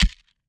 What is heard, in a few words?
Jump Landing